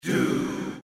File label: Dry